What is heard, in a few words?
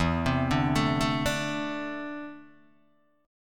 E 7th Suspended 4th Sharp 5th Guitar Open D